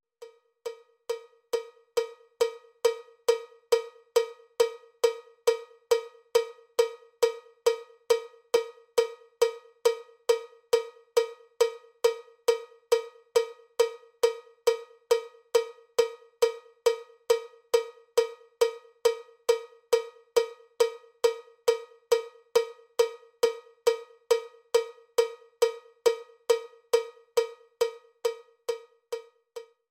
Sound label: cowbell click track bpm 79; cowbell click track bpm 137